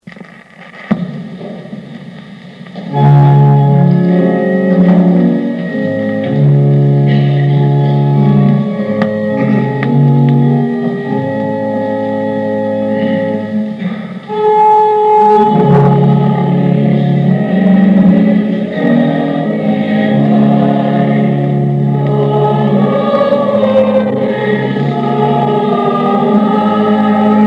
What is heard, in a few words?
Hymn, bells